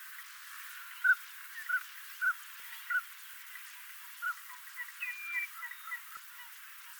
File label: Northern Pintail Anas acuta; waterfowl